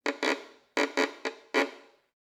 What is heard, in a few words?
Violin Scratchy Noises